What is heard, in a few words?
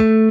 bass